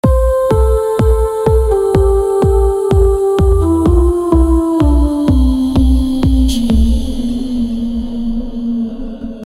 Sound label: a sophisticated deep house, deep house; SFX Engine